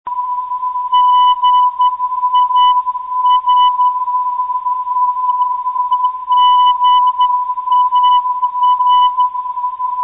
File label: gar 407khz, ndb